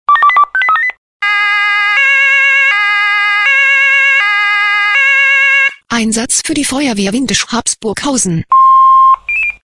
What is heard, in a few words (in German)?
SMS Alarmierungstone; FW Windisch